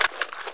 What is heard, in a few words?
RPG fidget, weapons; svencoop